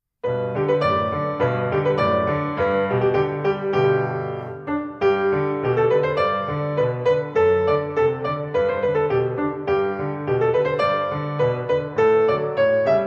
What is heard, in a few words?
Piano Accompa; 2-15 Hunters' Chorus; Suzuki Violin